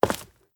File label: Stone Walk; moul